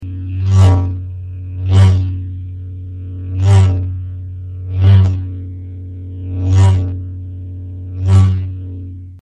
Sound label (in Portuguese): sabre, som de sabre de luz